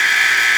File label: buzzer